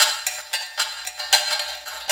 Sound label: House Bangin, Synchro Funk, Beats; Sonic Foundry (Sony Creative Software)